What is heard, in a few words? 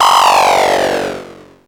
VCS BELL; ANALOG BELLS; Ambient; Zero-G; Ambient 1